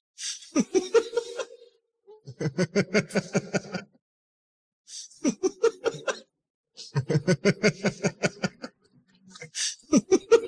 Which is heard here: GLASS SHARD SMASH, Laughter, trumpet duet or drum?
Laughter